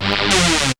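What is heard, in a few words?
bell trem; E-Lab; X Static Goldmine 1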